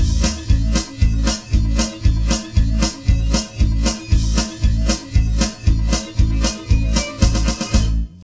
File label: Separated Percussive Sounds